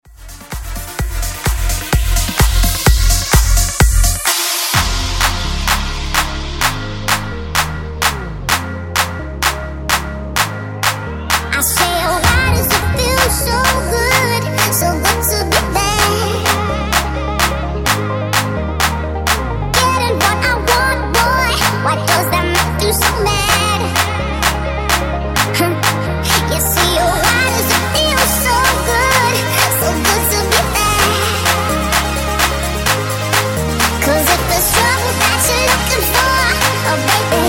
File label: clap drop 128 bpm